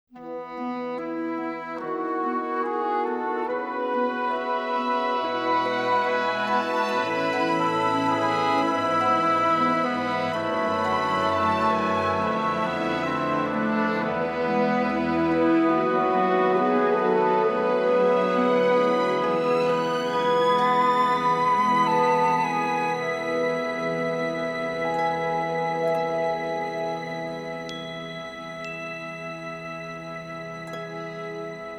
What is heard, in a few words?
Prelude